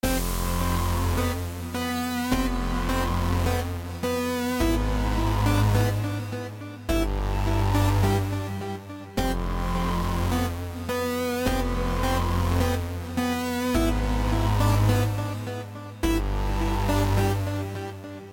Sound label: Game Over Screen; Foxyland 2